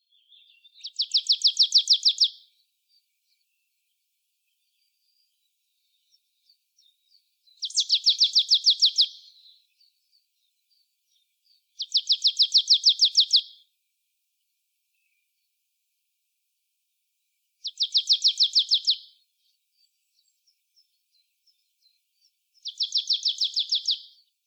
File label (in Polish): sikora uboga Poecile palustris